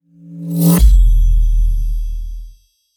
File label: Cinematic Whoosh; 电影感转场1